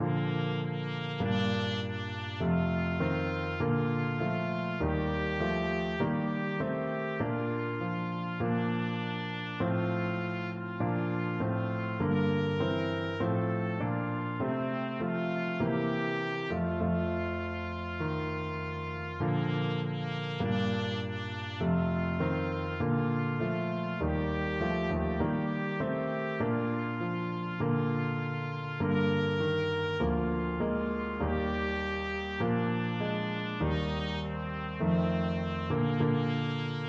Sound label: holy holy holy trumpet